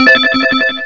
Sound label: Electric Echo; AudioClock 3.1.1